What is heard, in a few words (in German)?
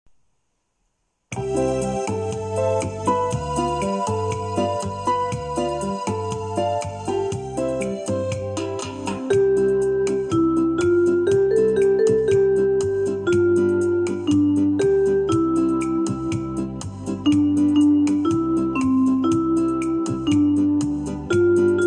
Orgel